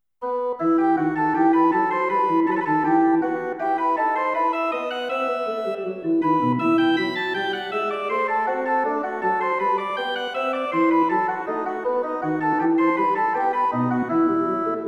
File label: Voluntary in E minor for organ; Haarlem